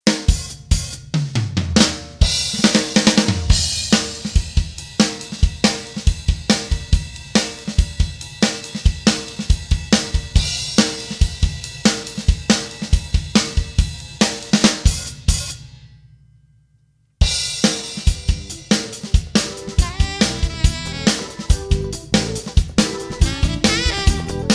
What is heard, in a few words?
R&B